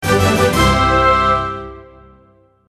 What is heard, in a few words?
Fanfare